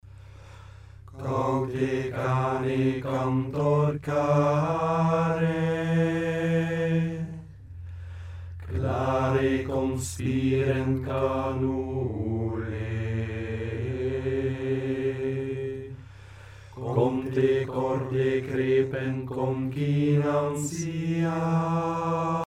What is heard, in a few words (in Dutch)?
Gregoriaans